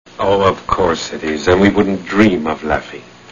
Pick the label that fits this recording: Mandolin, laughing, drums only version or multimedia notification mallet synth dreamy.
laughing